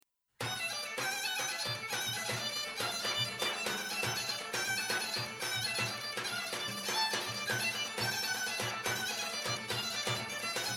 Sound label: ruchenitsa fast